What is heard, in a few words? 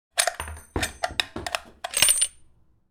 Sniper rifle round ejecting